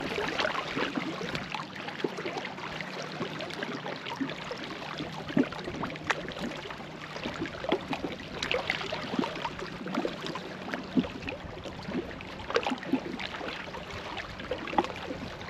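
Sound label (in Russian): calm waves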